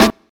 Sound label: original acoustic snare sample a key, steel snare drum tuned to the A note; snare-drum-samples